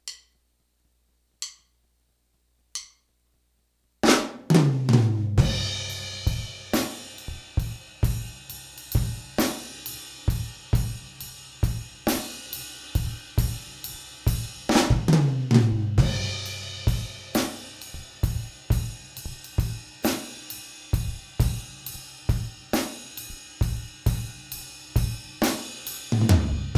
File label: Slow Blues, 45 BPM, A slow 6/8 blues groove.; drum